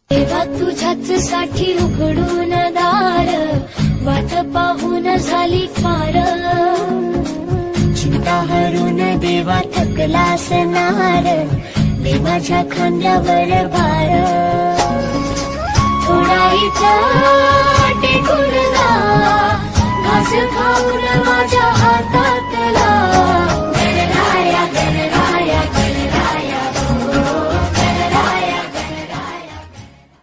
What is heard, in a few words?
Ganpati ringtone bhakti ringtone; ganraya